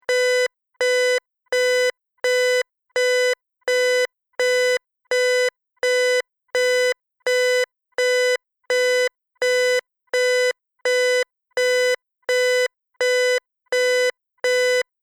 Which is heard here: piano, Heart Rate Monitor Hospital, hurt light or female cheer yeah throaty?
Heart Rate Monitor Hospital